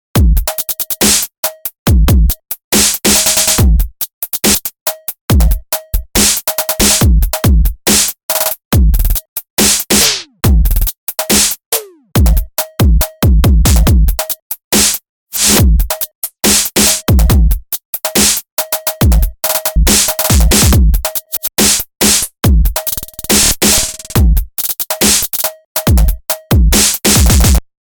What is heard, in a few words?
Glitch drum loop 140bpm; Dirtyjewbs